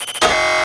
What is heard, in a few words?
Press Not Ready; Hunters and Props